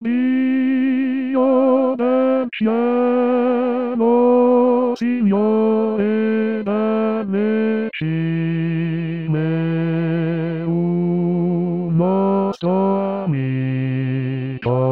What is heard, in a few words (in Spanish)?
B voz